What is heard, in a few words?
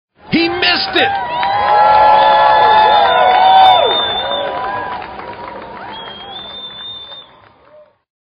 Happy Miss